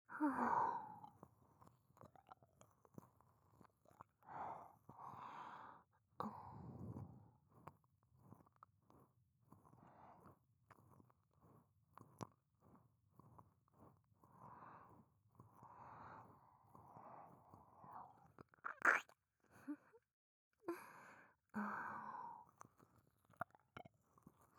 右耳舐め, ASMR

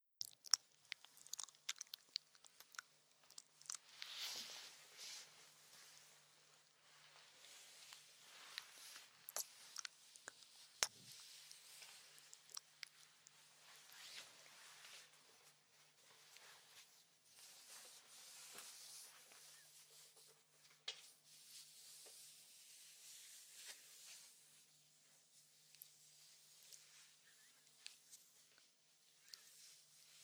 お姉さんの唾液たっぷりな癒しの耳舐めASMR

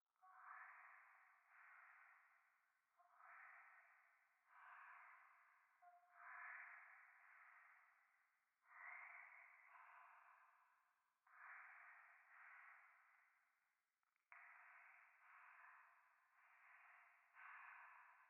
廃墟 右耳密着吐息

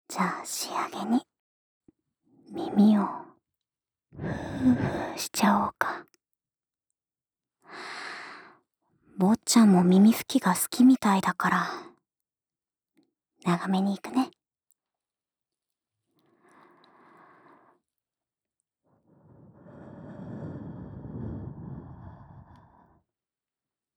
耳ふーふー（左）

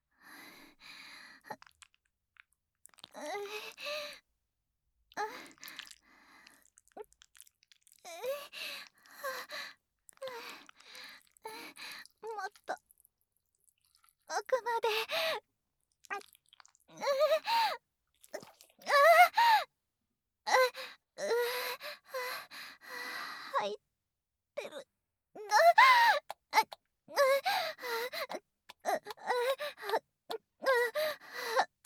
挿入 喘ぎ; SEあり

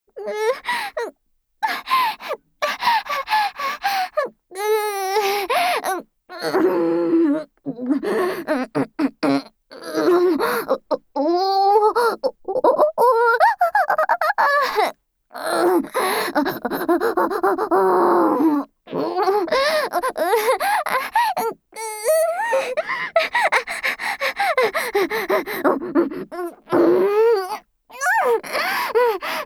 限界オホ声セックスループトラック, ASMR

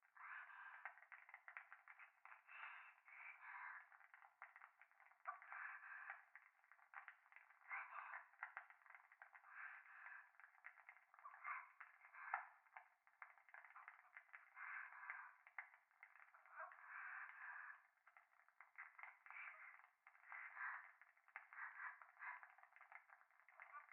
風呂 左耳密着処女喘ぎ 暖炉

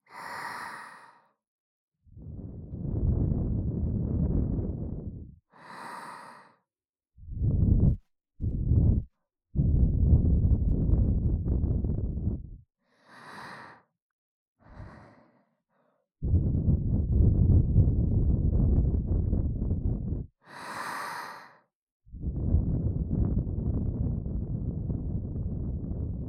【左】耳ふー, ASMR

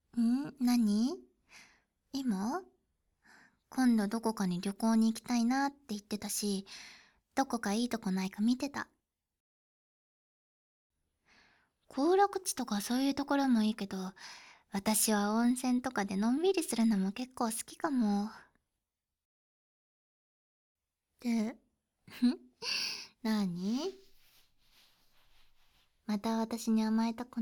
耳舐め 手コキ 射精音あり; 耳、舐められながら、だよね♪

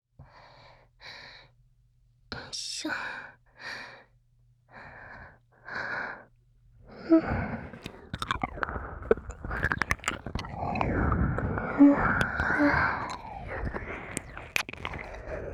耳舐め; 耳舐めしないと出られない部屋

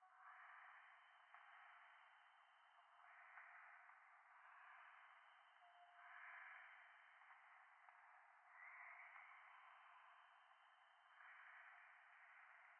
右耳密着吐息 山奥

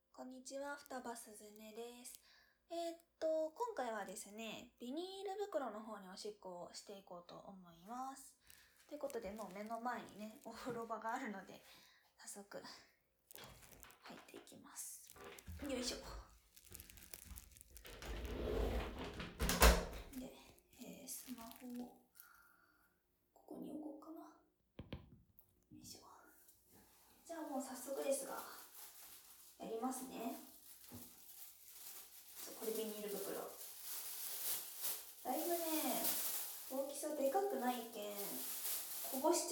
ビニールに！お風呂で！オシッコですッ！

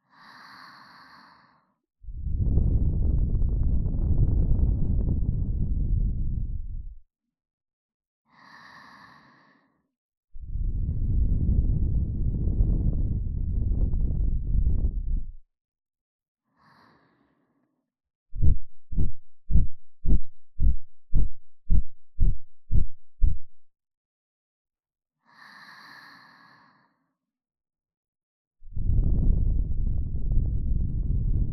右 耳ふー, ASMR

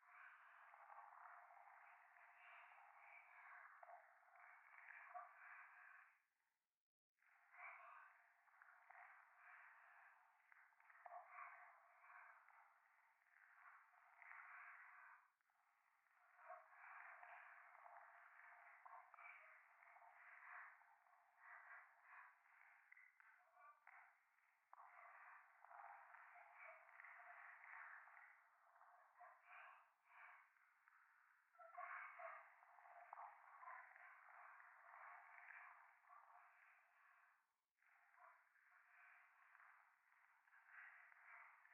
左耳 密着 処女 喘ぎ 触手; 夢の中

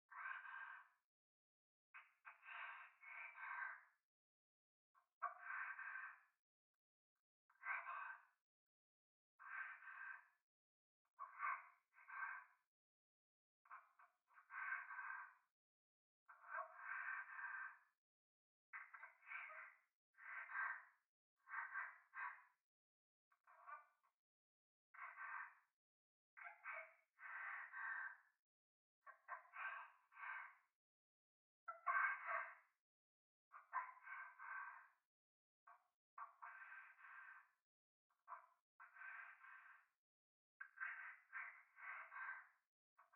トイレ 左耳 密着 処女 喘ぎ

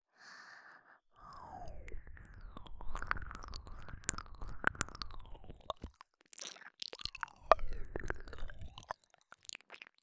耳舐め

